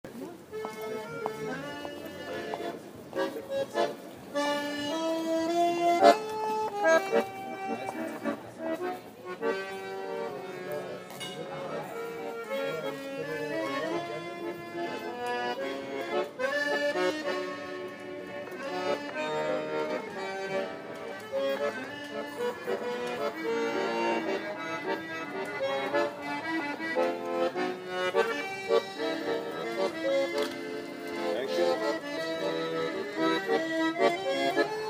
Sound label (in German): Akkordeon